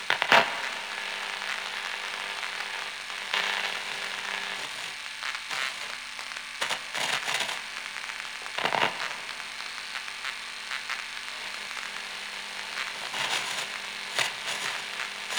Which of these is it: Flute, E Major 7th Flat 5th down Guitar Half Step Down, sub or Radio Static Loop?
Radio Static Loop